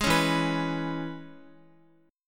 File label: E 6th E, Guitar; strummed